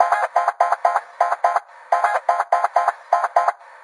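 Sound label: almost a guitar jam, 125 bpm; SYNTH AND ELECTRONIC LOOPS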